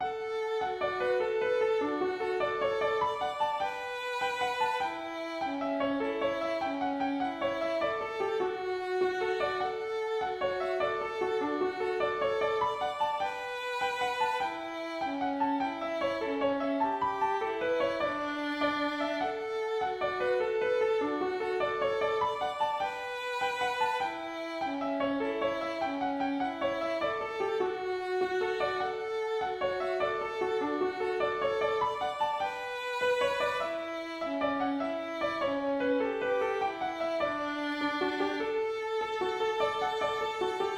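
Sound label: jig, melody and 1st harmony; Goldenrod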